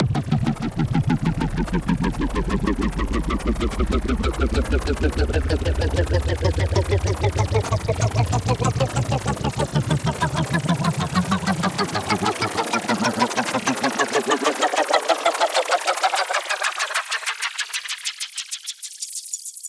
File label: Club Music Risers Fluctuation